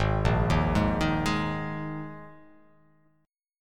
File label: G sharp Suspended 2nd, G sharp, down